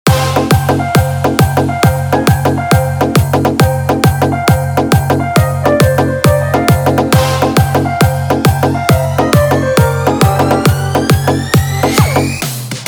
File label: Trance